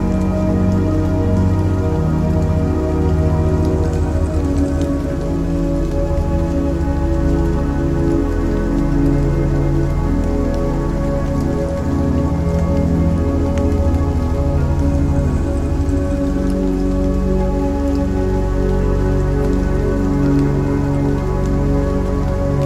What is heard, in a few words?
meditation Zen Music